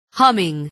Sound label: humming